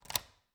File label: Cabin, front door, deadbolt, lock, latch, thick, close, hyper; Eiravaein Works; Vaeyan IV